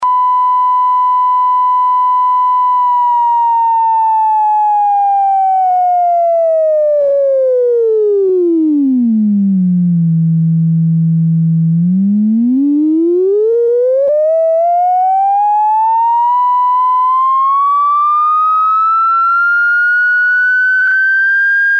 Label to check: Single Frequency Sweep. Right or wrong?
Right